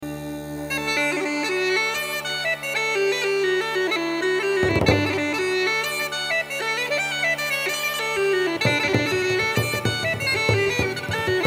Folk music; موسیقی بی کلام فولک

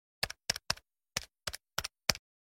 push button telephone; شماره گرفتن تلفن دکمه

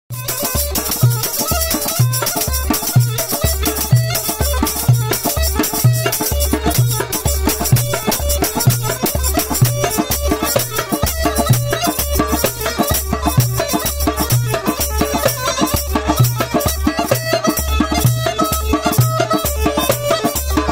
Ney anban sound; نی انبان

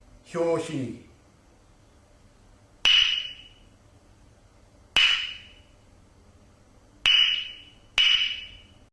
Samurai

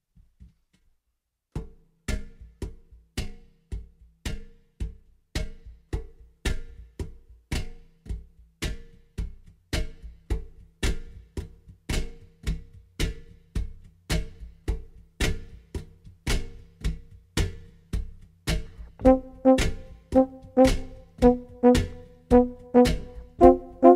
Euphonium; یوفونیوم

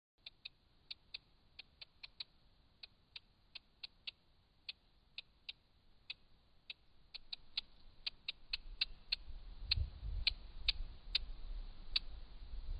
Numbat, حیوان نامبت

